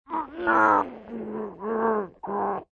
Gag, Gagged male attempting to speak - Variation 3; Gagging; Voice